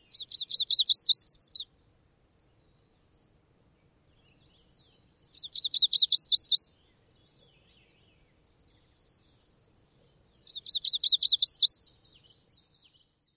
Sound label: songs; NY